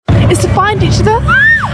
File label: screaming